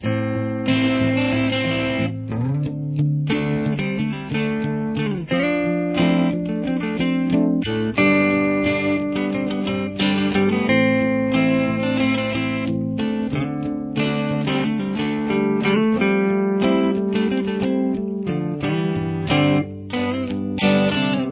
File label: full verse; Here they are together